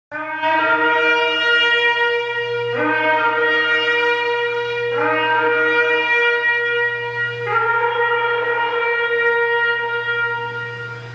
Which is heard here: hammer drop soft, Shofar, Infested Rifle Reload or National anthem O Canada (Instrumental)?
Shofar